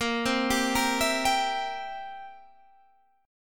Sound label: C 7th A sharp